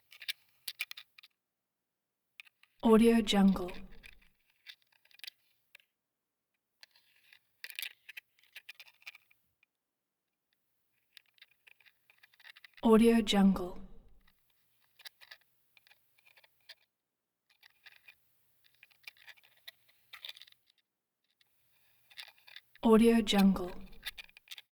crab shell movement

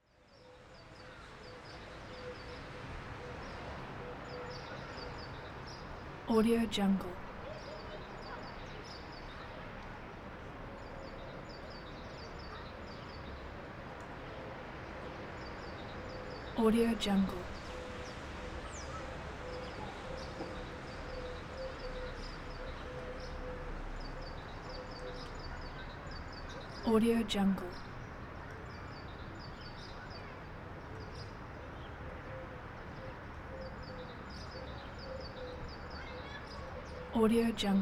high building roof city ambience

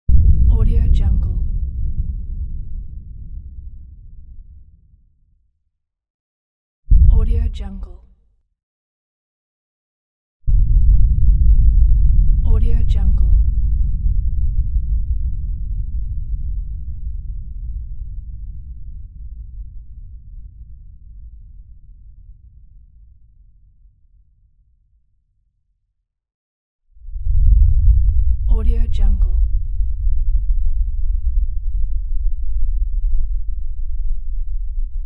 low underground rumble

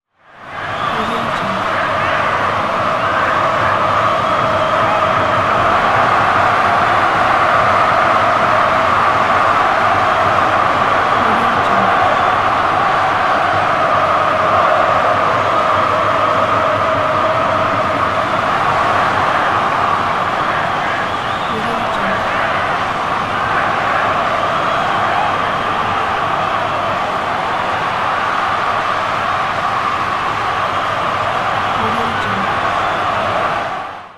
noise of crowd stadium

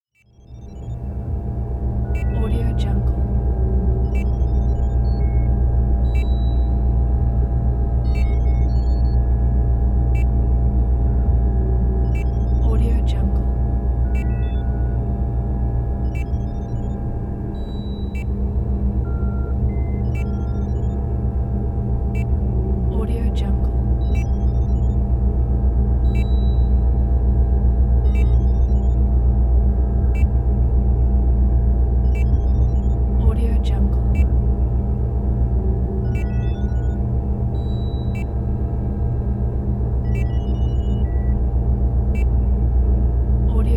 spaceship control room ambience

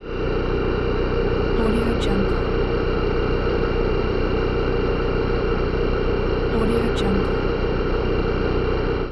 generator motor

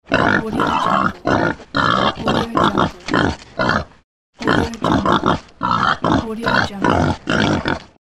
pig

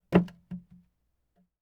chair drop

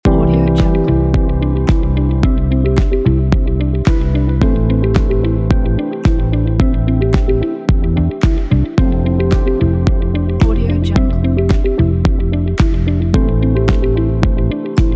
calm corporate progress

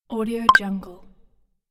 click

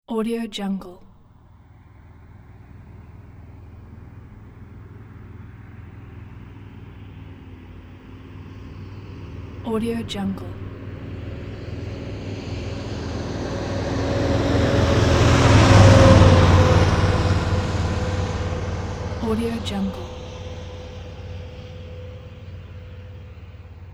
truck pass by

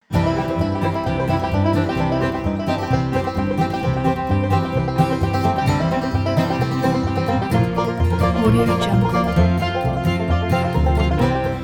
bluegrass picking

